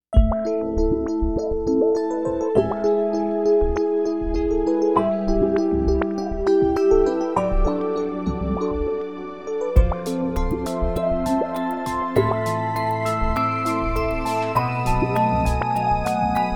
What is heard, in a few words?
Fantastic Sad; 리듬